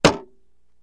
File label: Mallet Hits Wood Shield